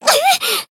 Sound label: damaged, jp; renri